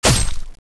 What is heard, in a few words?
hit bod, weapons